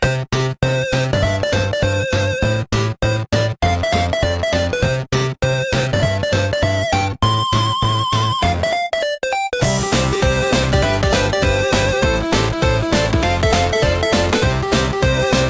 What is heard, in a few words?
Chiptune